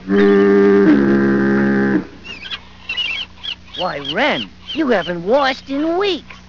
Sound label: FOGHORN